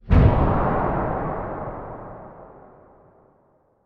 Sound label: Level Up; Regions of Ruin